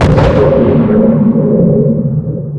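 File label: buff electric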